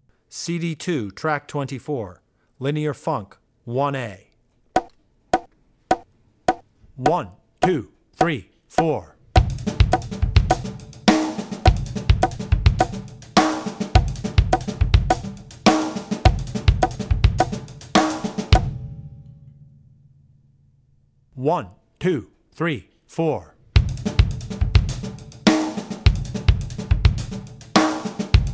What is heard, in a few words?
Funk - Linear Funk; Linear Funk (Example 1A); Drummer's Bible Tracks